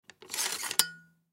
Matte Box 6x6, Matte Box 6X6 Filter Tray Slide Out; Technology; Materials